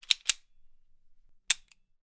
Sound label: gun tokarev semi automatic pistol cock dry fire; sound effects one